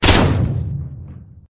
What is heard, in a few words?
land bomb